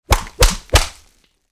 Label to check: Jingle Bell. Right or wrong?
Wrong